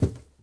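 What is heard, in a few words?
bodyfall metal; Star Wars Obi Wan; XBOX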